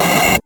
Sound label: Minimal Perc; Minimal Percussion